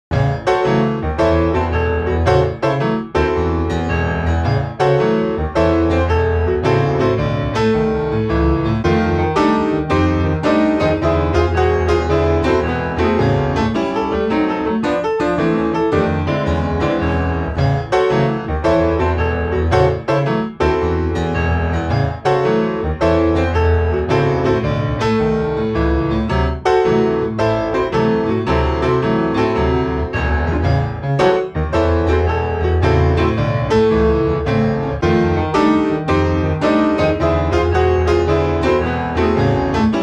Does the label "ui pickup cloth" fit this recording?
No